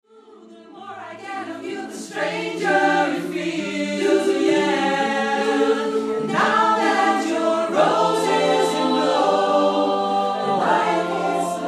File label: ssatb